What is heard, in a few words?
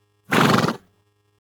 horse snort arabian; smartsoundfx